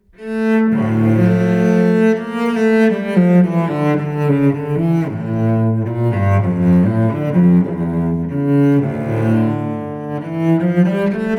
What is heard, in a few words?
Cello Suite No.2 in D minor, BWV 1008 [Allemande]; bayonetta bloody fate; Bar BGM